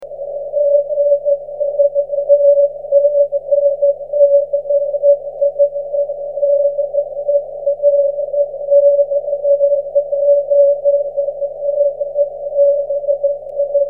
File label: 6cm eme cw